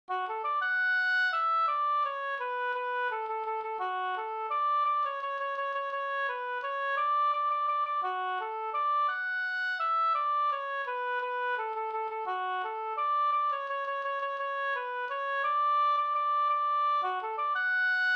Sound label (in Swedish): vals